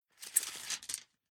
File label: Metal Tape Measure Pulling Tape Out Of Casing; Tom Hutchings